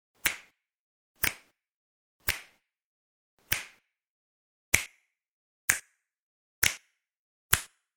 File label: Clicking fingers; Snapping; Human